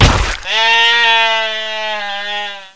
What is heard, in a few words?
Sheep Hit By Car